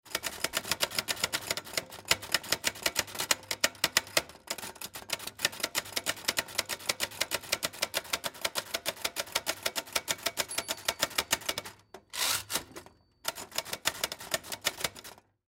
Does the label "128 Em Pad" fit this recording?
No